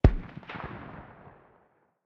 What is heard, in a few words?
hmg m2 far distance, 12.7x99mm; tacz-tweak-pack; taczmechanics